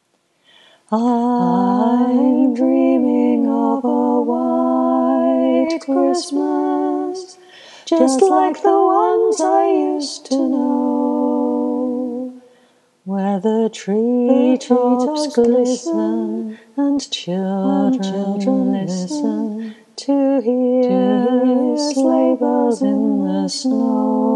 choir

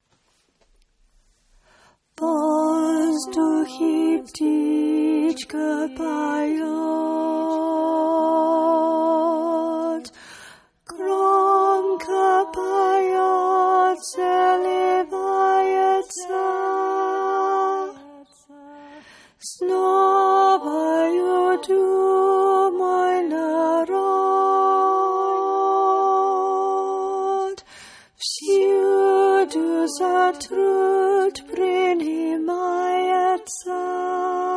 alto

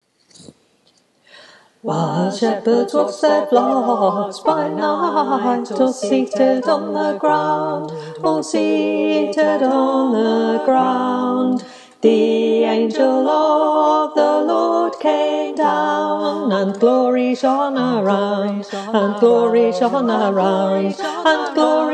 Tenor, choir

